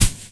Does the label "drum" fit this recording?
No